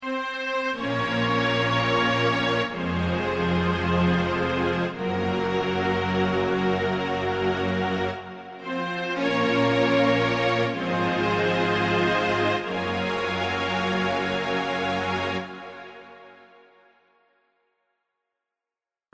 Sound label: VIOLINS